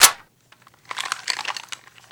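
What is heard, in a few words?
weapon foley mg4 reload hit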